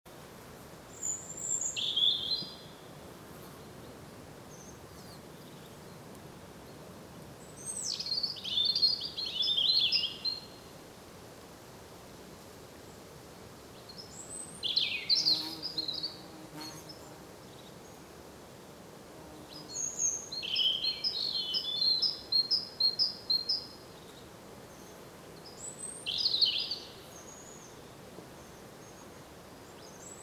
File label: Erithacus rubecula; SETE